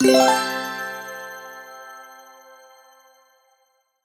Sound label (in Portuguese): Efeito Sonoro Sinos Conquista do Prêmio